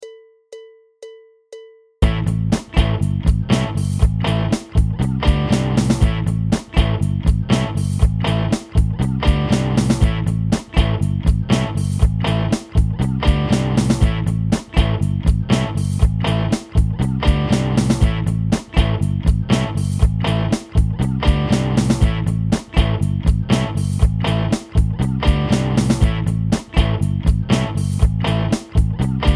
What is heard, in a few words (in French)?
debutant groove